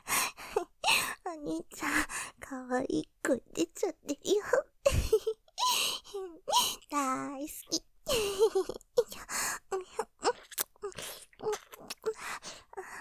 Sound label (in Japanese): フェラ, ASMR; お兄ちゃん可愛い声出ちゃってる♪; ループで楽しむ！シェフの気まぐれ抜き抜きコース; モノラル